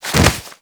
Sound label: Generic Roll; Warframe; Characters